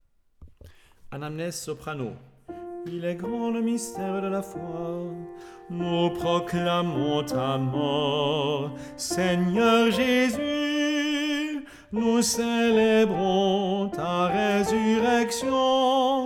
Chants Anamnese

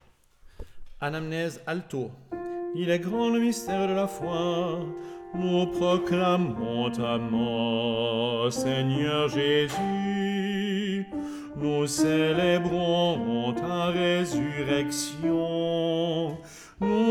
Chants Anamnese A

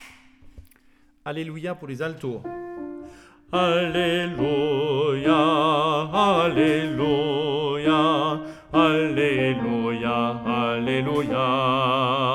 Chants Alleluia A

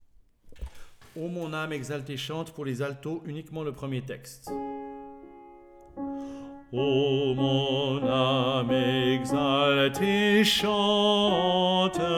Chants O mon ame exalte et chante A

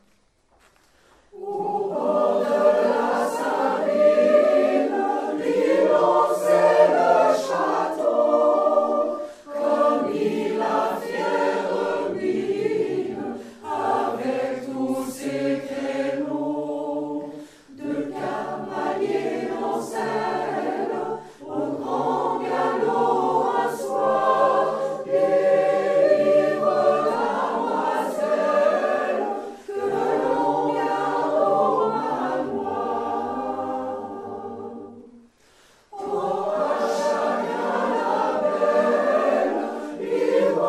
choeur chant

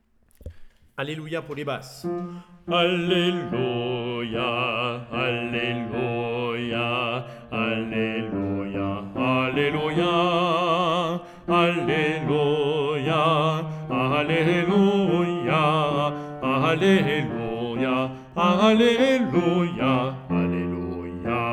Chants Alleluia B

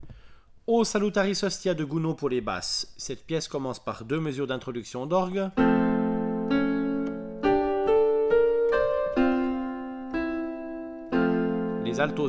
Chants O Salutaris hostia B

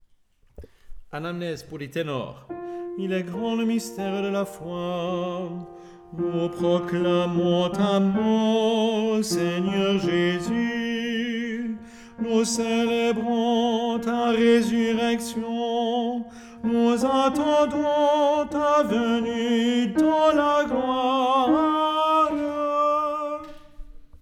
Chants Anamnese

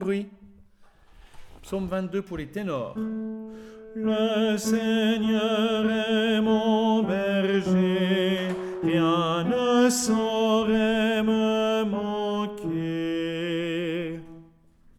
Chants Psaume 22 T

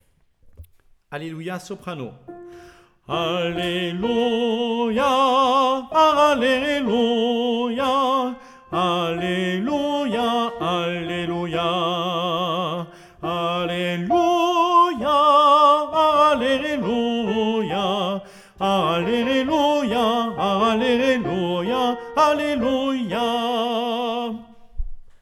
Chants Alleluia

